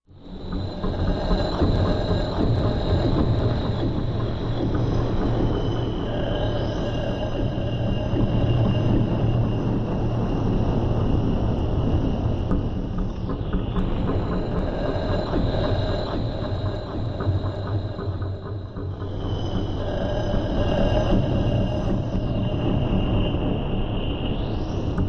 Empty Fear Low Vibratio, sci-fi ambience

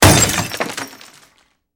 Glass Breaks Medium; windows & mirrors

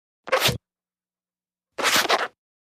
Pistol Squeaky

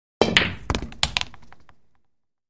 Billiards Cue Ball S

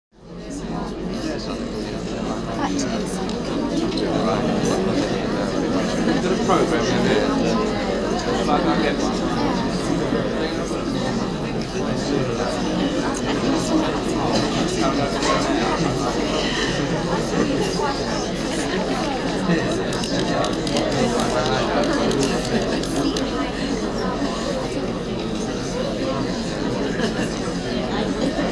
Theater Crowd Buzz; murmurs various

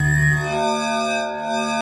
Space Laser Grind, space lasers

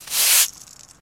Sweep Broom On Cemen; brooms & mops

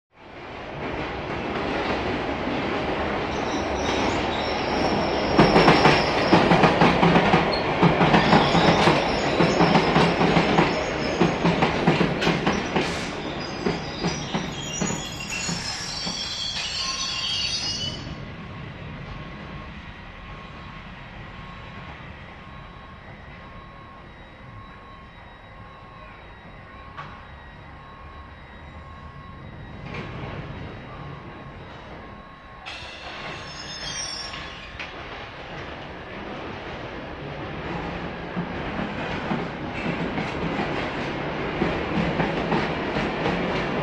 Train Stn London Sub, train station

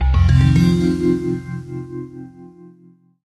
Bell Arpeggio Logo, stinger

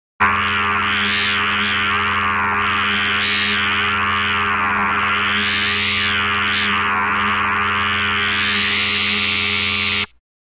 Multi Pitched Oscill, buzz and hum

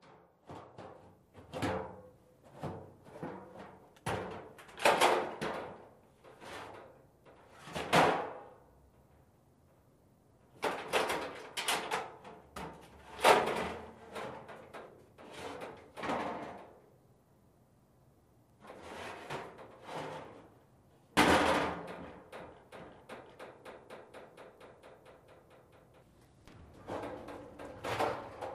Garbage Cans Lids Han; barrels buckets & cans

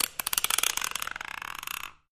Thumb Screw Turns, gears

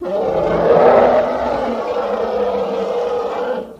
Elephant Roar Far

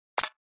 Button Thin Metal Dro

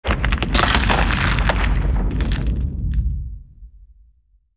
Earth Crack Large, earthquakes

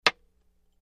Wood Staff Hits Light, spears & staffs

